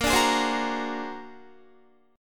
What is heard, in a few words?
Bb Augmented 9th Bb, Guitar; Bb+9; strummed